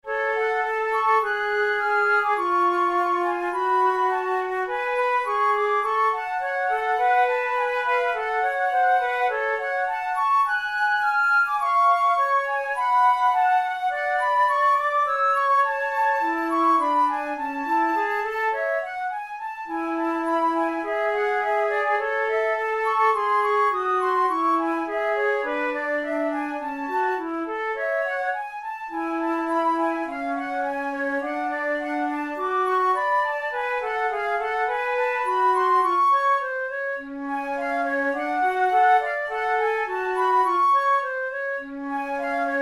duet in f sharp minor; kohler op93 no1 duet no10

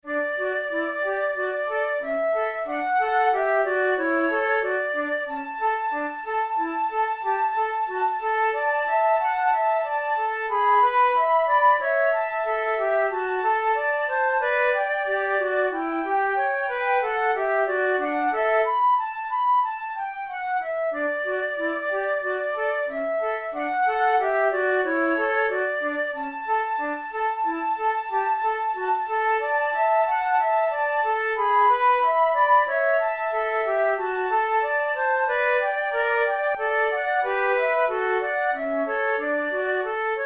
flute; school of flute grade1

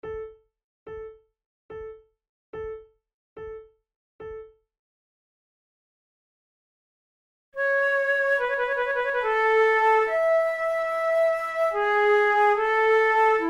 sarabande, flute

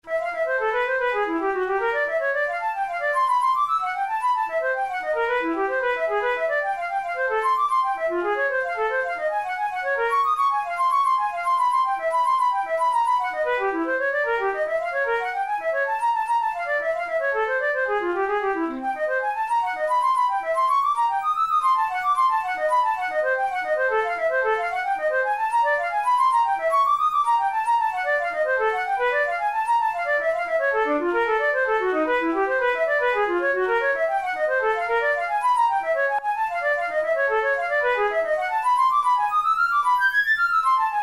studies for flute solo; karg-elert

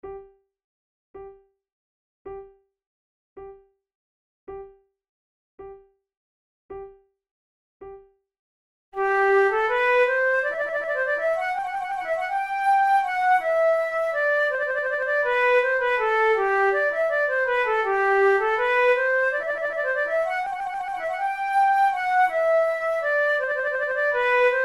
rondeau, flute tunes; naudot; 6 babioles op10 no5

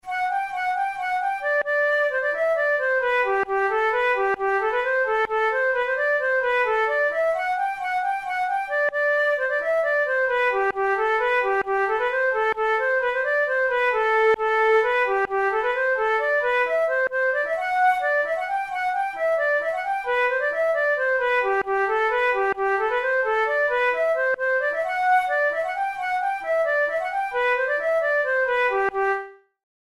polka, flute

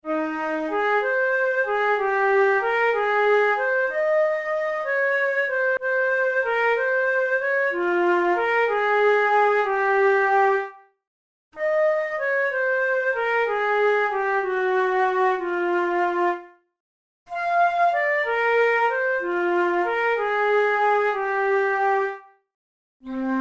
studies for the boehm flute; drouet 72

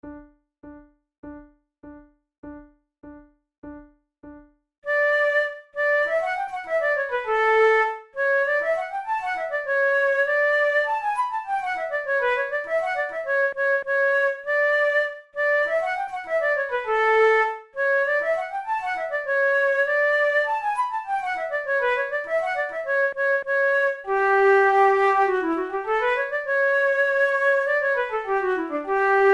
pour la flute duos allegretto; devienne; nouvelle methode

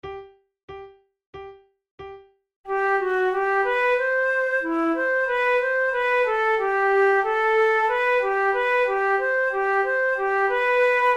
duets, flute; forty progressive duets

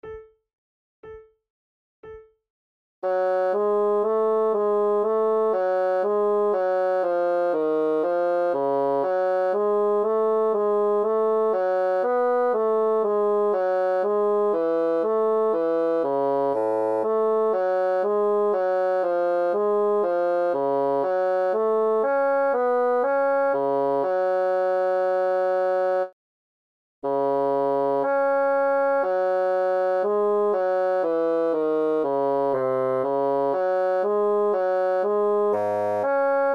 larghetto, flute; ranish

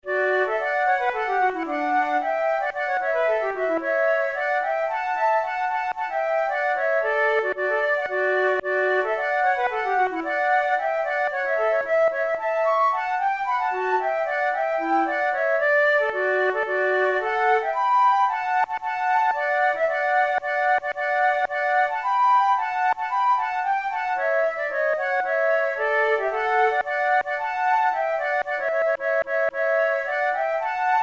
duet

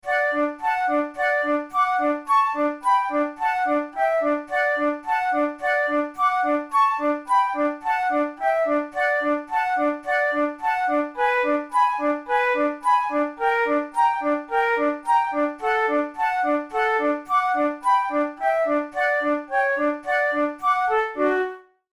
methode de flute; tulou

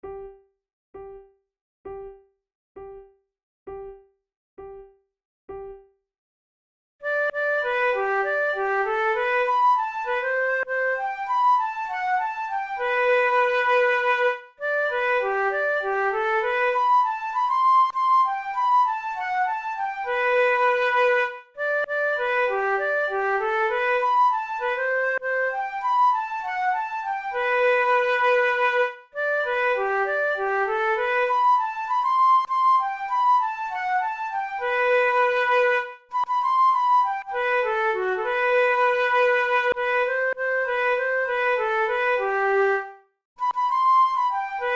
duet, flute

